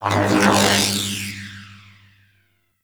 Shield Electricity; Warframe; Volt

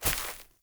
Grunt Step Veg, Footsteps; Enemy; Characters; Corrupted Sproutfolk

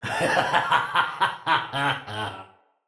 Laugh; Encounters; Medis Gyon

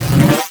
Ability Cast; Warframe; Excalibur

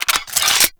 Sword And Whip Unequip; Warframe; Weapons

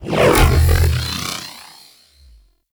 Eruption Enemy Lift; Warframe; Iron

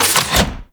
Gas City Medium Door Close; Corpus

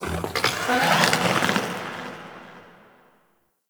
Lair Exterior Creature; Ambience; Misc Creatures; Sentient; Warframe

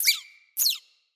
Floppet Double Squeak, Animals, Vocals

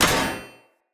Short Sword Medium Impact Heavy Armour

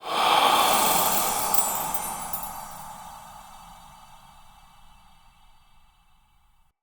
Game Start; Minigames; Pied Piper

